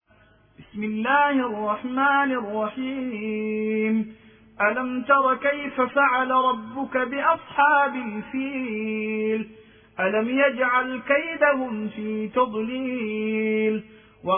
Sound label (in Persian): ترتیل قاری سودانی روایت الدوری عن أبی عمرو; محمد الزبیر; سوره فیل